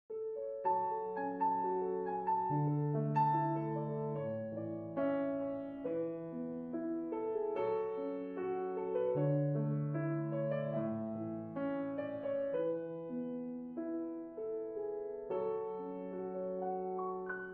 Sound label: Piano Ambient